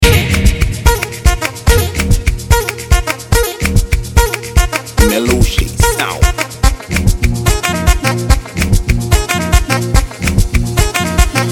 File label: Mara Riddim Beat